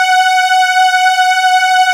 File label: PAD WARM, Nord